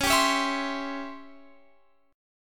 C sharp Minor Major 7th Flat 5th Guitar Open F; C#mM7b5; strummed; x,20,19,20,19,19

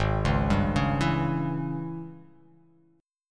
Ab Major 7th Suspended 4th Sharp 5th; arpeggiated; 4,4,5,6,4,x,x,x; AbM7sus4#5; JGuitar

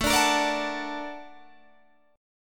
A sharp Augmented Major 7th A, guitar

